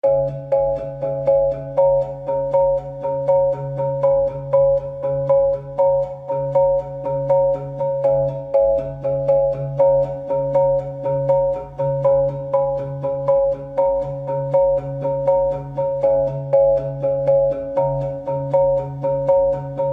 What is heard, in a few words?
Documentary Vibe; Noisebiscuits